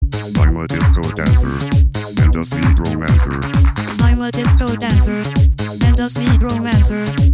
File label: robot disco dancer